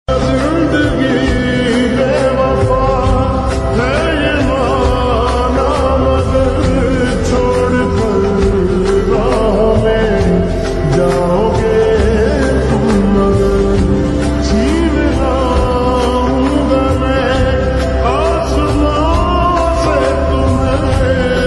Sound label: Sad Ringtone